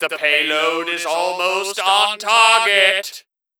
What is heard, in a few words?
Bomb Alert; Gray Mann MvM